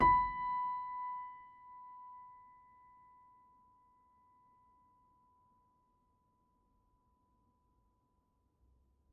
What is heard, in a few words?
Upright Piano, Sustains, B4; Chordophones; Knight